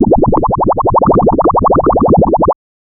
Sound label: power, user interaction